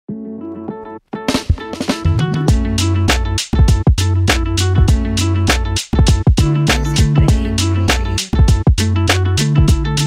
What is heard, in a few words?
Hip Hop